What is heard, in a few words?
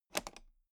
toolbox latch open loose